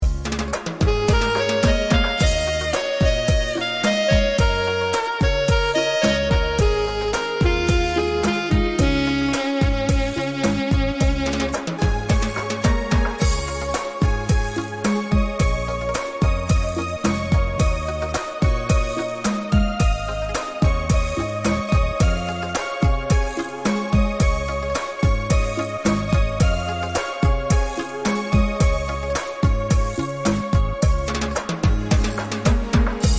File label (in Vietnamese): beat chuan